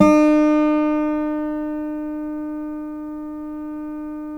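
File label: GUILD 12-string, Guitars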